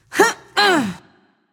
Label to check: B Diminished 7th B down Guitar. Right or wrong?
Wrong